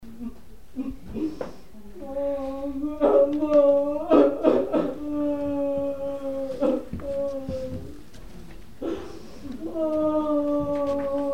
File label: funerals woman crying; 2 shooting victims buried